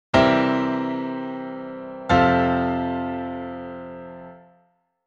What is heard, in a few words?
Classical cadence